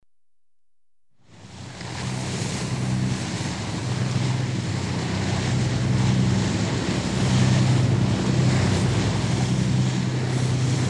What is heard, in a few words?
Lifeboat, Bow wave (from on deck); The BBC Sound Effects Library